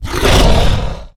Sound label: Exertion Light; Evolve; Goliath